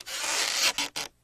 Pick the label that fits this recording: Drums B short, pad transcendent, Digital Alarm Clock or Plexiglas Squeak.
Plexiglas Squeak